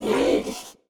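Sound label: Monster rabbit ugly breath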